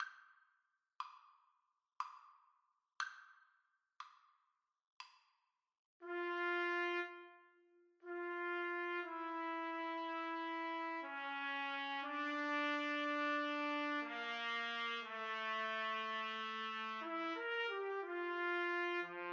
duos adagio, trumpet duet

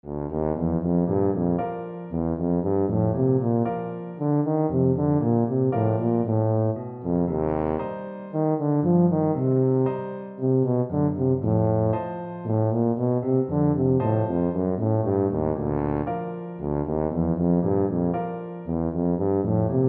tuba

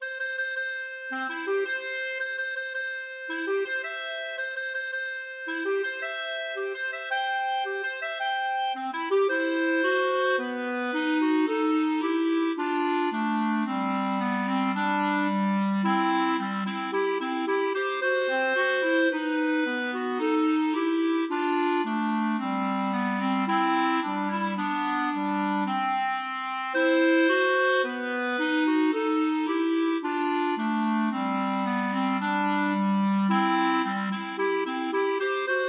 clarinet duet, wedding march; mendelssohn

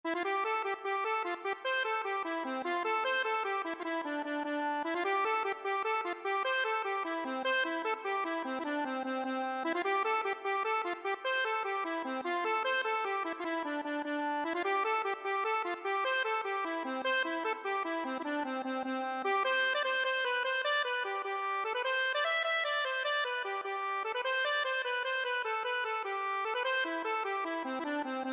keepsake, accordion

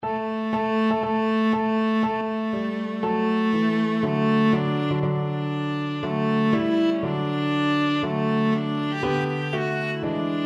wedding march, viola; wagner

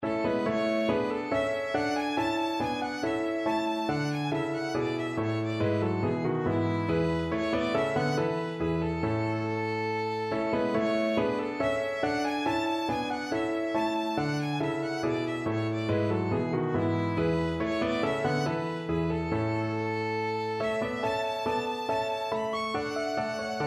wir gehn nun wo violin

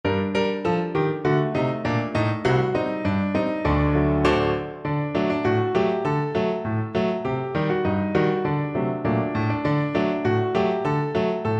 PNO

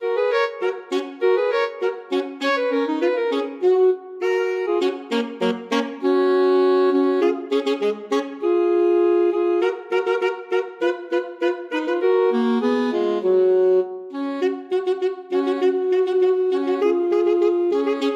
alto saxophone duet

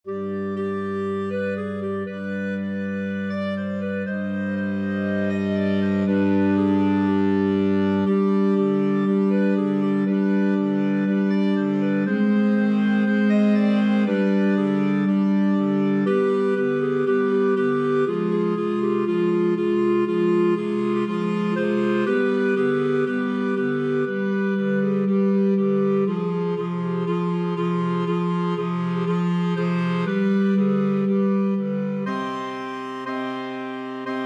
clarinet choir

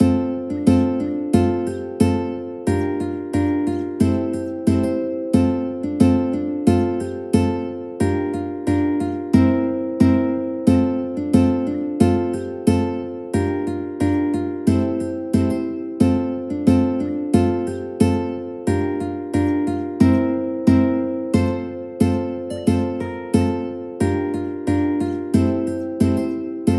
wedding uke duet

